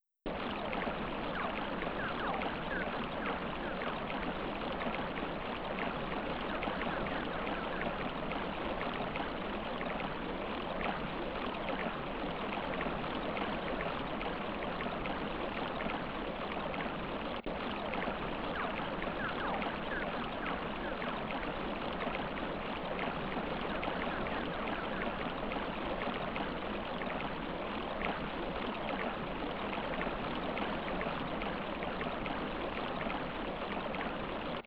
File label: Peaceful Rest Valley ambiance; mother2